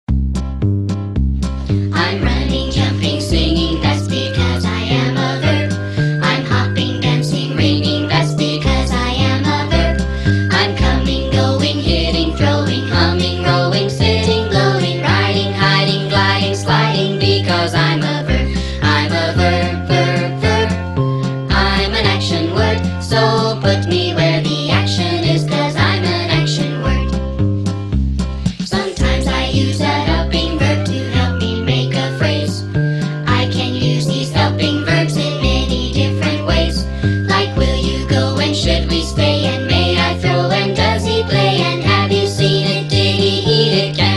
Verb Song

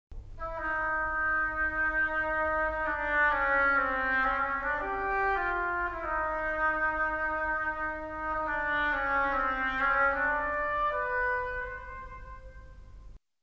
oboe

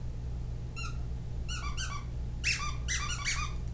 Squeak

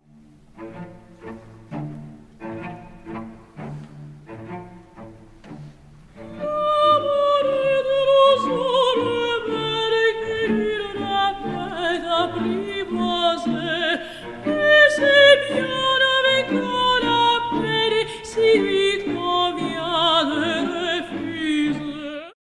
mezzosoprano

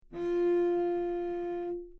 cello, 90

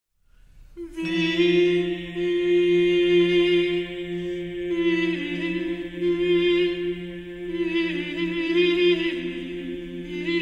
organum

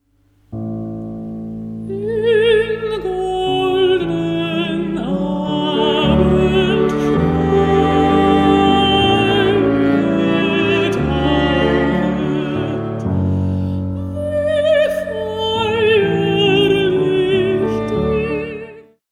contralto

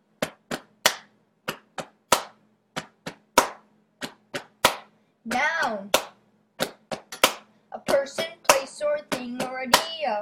Parts of Speech Song

